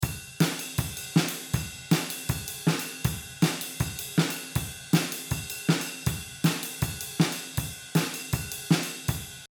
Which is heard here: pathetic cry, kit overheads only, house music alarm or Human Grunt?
kit overheads only